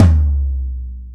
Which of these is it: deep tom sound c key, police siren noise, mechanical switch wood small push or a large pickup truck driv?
deep tom sound c key